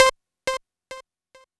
C5, SynthLine; 90 s Sample CDs; NiceBeats; SynthLine VOL-1

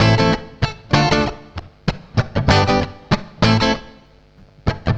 CLEAN, CLEAN GTR

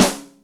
Old Can, Yamaha DTXpress Acoustic Snare

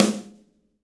SD Real, Roland; Sound & Vision - Gigapack I

